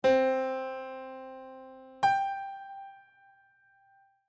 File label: music intervals perfect twelfth